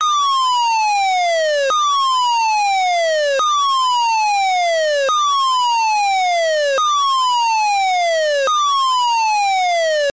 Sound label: yeow decending high to low, sirene; DP3000